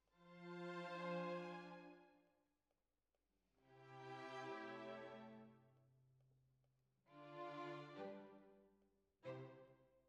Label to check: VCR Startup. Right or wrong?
Wrong